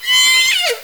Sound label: calls, Sea Sounds